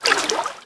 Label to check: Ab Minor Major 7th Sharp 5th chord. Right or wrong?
Wrong